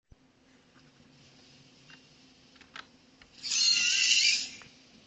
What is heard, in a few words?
scary roar